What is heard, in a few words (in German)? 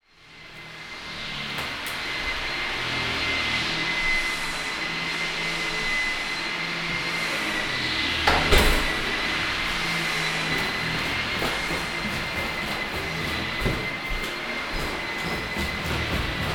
stufen runter 4mal; thesoundofgentrification